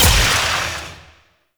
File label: Shot Medium; Duviri; Incarnon Adapters; Weapons